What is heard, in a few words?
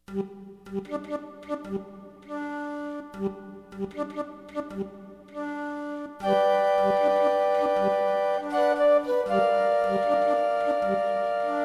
4 Flutes